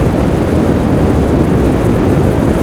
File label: fire loop; Rust